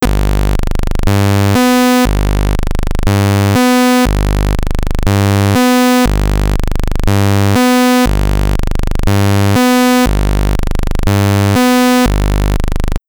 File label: vco test